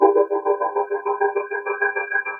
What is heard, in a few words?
100 synthy filter echo; SAMPLED MUSIC LOOPS